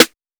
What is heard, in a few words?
Trap Snare; Hard hitting and production-ready snares for Hip Hop and Trap; synthstudiosind; SS Trap-Snare Vol 1